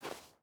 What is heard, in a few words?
footstep burlap snow walk; Rust